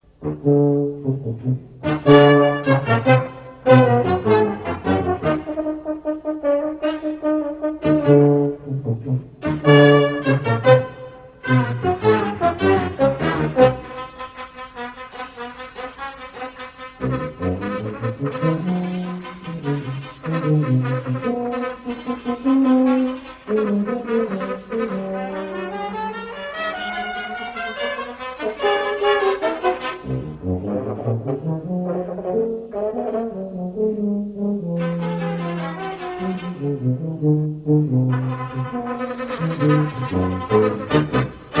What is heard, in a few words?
Basso, Basso Concertino (for Solo Tuba and Brass Quartet); Basso Concertino was composed in 1984 to be used by the composer as a tuba feature in a brass quintet setting